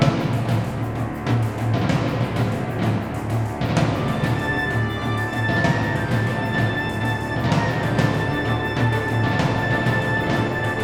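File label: sound music catacombs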